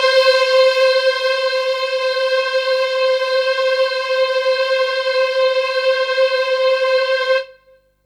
Synths HiString C; Vengeance Samples

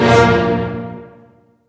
Synths C; Vengeance Samples

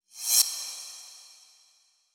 Cymbals Reverse Crash; Vengeance Samples

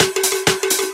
Minimal Fills; Minimal Loops

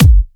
138.5 BPM NuTrance Kick; Vengeance Samples

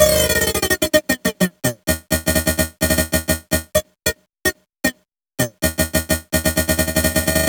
128BPM Tribal Kiss Melody

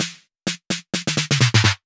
Snare Fill, 128 BPM; Vengeance Samples; VTDS2 Song Kits; Rap To The Top

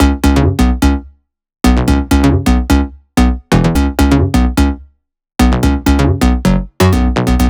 128BPM Fragile Melody; Vengeance Samples

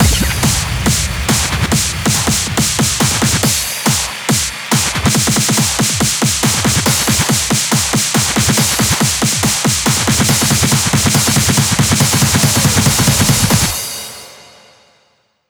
140BPM 8Bars, Hands Up Trance Fills; Tuner; Vengeance Samples

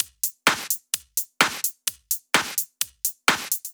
Drumloops 128BPM

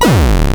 Arcade Riffs

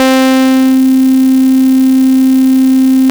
Basses C; Vengeance Samples

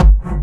Bassdrums Hard Kicks; Vengeance Samples

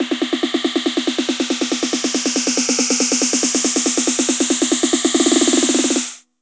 140BPM SNARE 4 Bars; Artificial; Vengeance Samples; Snares FX Pairs

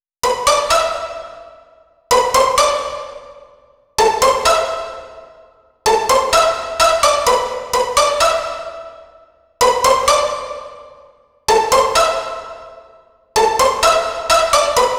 Pizzicato, 128 BPM; Vengeance Samples; Vocal-Hook Kits; Song Kit

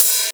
Open Hihats, Cymbals; Vengeance Samples

